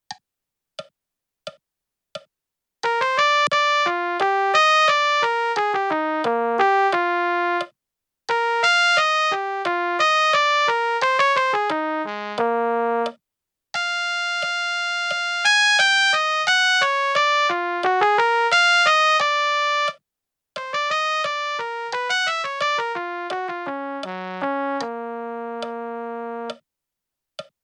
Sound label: trumpet etude; janfest